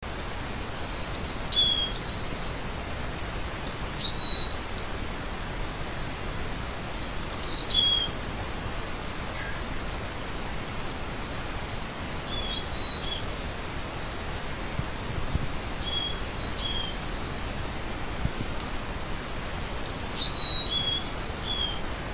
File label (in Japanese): yamagara, bird; ヤマガラ （山雀）; 平成１６年５月１３日 忠魂碑付近遊歩道; 蘭梅山; 野鳥